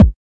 kick

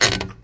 advance paper fast, Typewriter

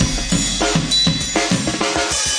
100 big clanger, drum loops and breaks; 091 to 100 bpm

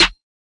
Closed, Hip Hop Kit; drums; STYLE KITS (Hip Hop, Etc); Khezie Hip Hop Kit

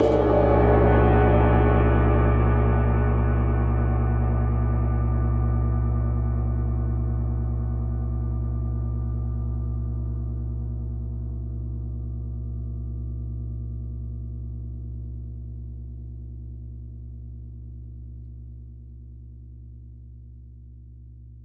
biggest gong ever; Gongs and Super Crashes; single hits

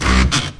Electro FX; Ueb HE Electro FX

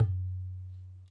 Ghe Open, Tabla; ETHNIC and WORLD PERCUSSION; Tabla Kit Large

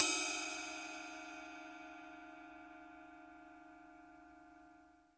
CYM RIDE, DRUMS SINGLE HITS

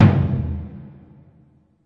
big burundi, African Burundi Drums; ETHNIC and WORLD PERCUSSION

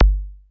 kick, drums single hits; iElectribe Kicks

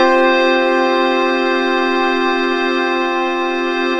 mystery cluster pad; Pads

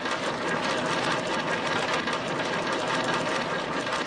wood cannibal, looping ambience

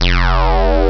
deep sweep, synth

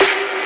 melbourne bounce snare big; STYLE KITS (Hip Hop, Etc); D3ron Melbourne Bounce Kit

